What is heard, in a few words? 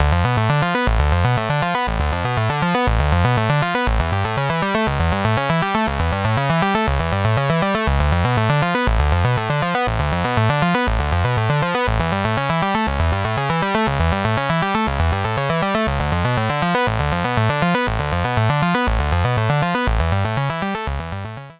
Arpeggio VCO Saw; WAVES Element 2